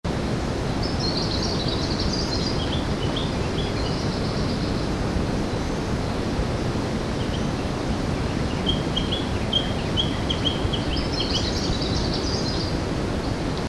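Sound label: dark newtonia, Madagascar; Madagascar - 20th Oktober to 16th November 2003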